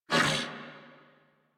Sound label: Pain Grineer Male; New War; Narmer